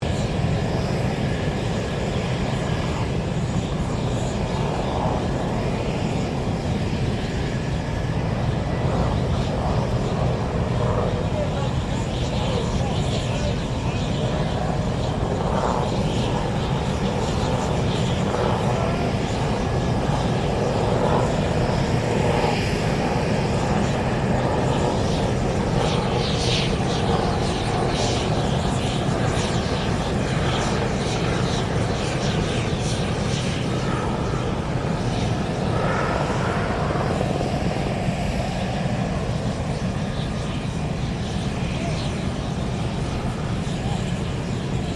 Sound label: F16 Falcon idle, stereo; Airplanes; Vehicles